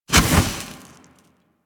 Fire Stop, Flame Thrower; Weapons; Warframe; Ignis Wraith

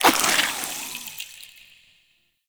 Poison Shroom Spore Cast; Warframe; Nokko; Gyromitra

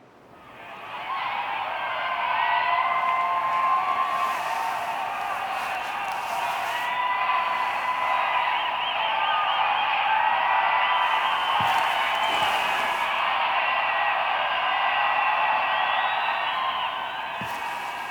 Kiss; Eleanor; Warframe; Nineteen Ninety Nine; Quests; Romance NYE

